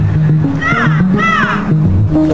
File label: Caw Caw